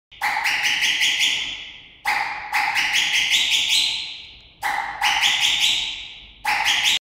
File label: Hi Do turkish whistle